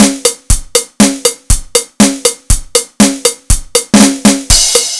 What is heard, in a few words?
dance house drum loop